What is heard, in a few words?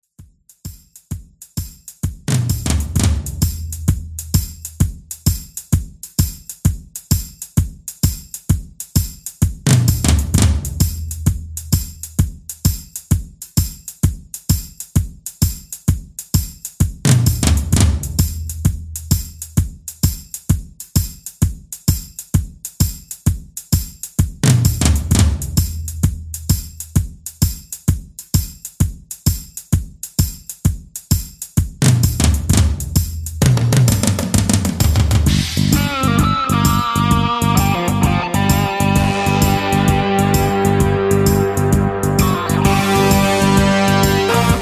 Thunder and Lightning Long Intro